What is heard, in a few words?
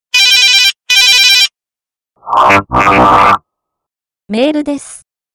ring mail